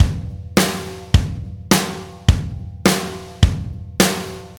105 bpm breakbeat sample g key, Free breakbeat - kick tuned to the G note. Loudest frequency: 1869Hz; drum loop